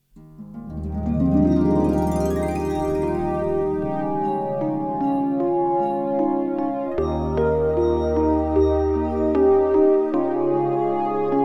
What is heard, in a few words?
Symphony Orchestra